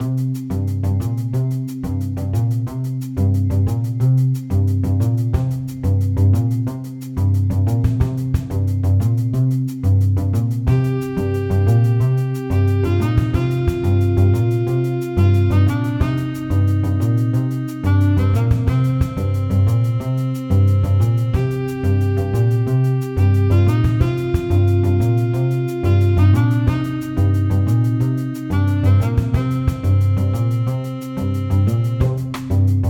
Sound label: Jazz